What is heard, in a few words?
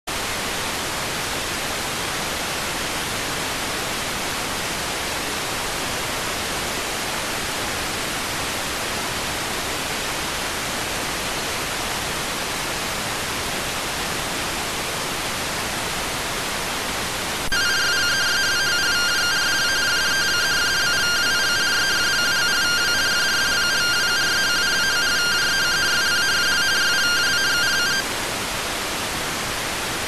rtty rx